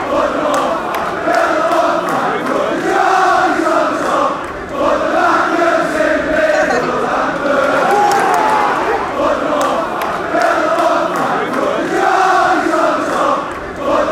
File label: build a bonfire, fan chants